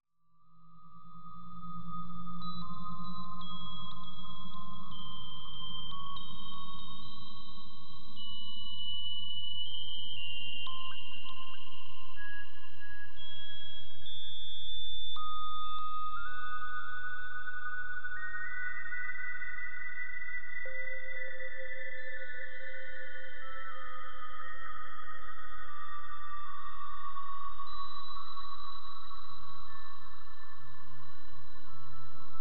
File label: Loop